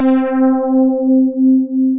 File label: Piano, synth keys; Instruments; Tangerine Piano; Ableton; Synth Essentials; Operator